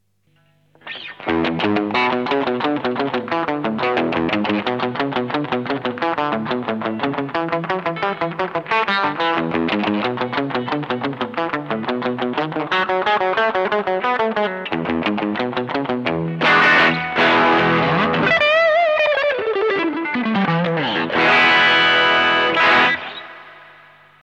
Rodeo Drive, OD MODE, DS MODE; PLEMIUM TWIN（OD＆DS SWAP); LAVEL4 TONE7 DRIVE8; LEVEL4 TONE7 DRIVE8

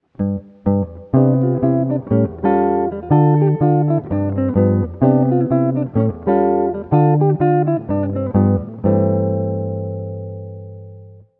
S Guitar

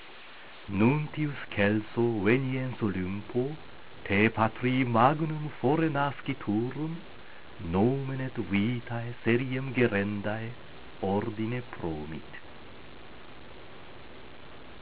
Utqueant, latin

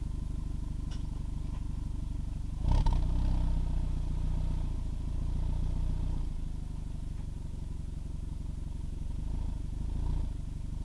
Muffler, CAR

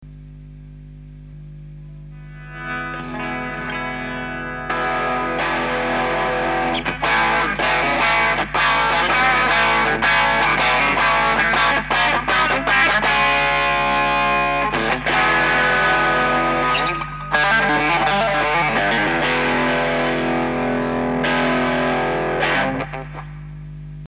BOSS OD-1 OverDrive, CLEAN DRIVE, GAIN DRIVE; LEVEL5 OVERDRIVE8; LEVEL9 OVERDRIVE9

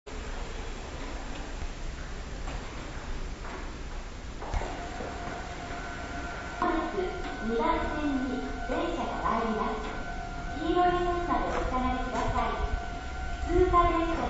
train; omocha

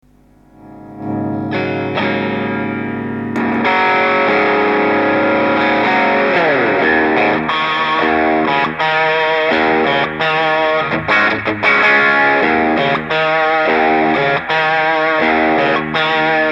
SuperOverDrive SD-1, CLEAN DRIVE, GAIN DRIVE; MODIFY; LEVEL5 TONE4 DRIVE7; LEVEL4 TONE6 DRIVE9; GUITARDER; BOSSのSD-1のモディファイ。; トゥルー・バイパス。

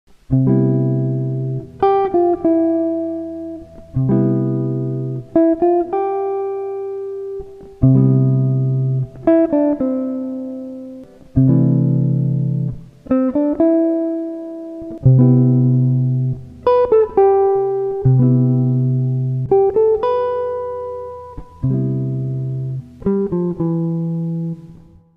S Guitar

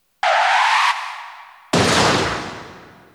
car stop crash

